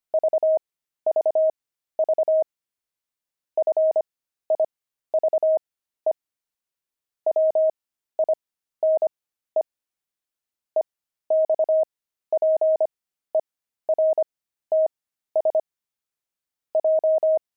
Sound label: pangram, intermediate pangrams files; wine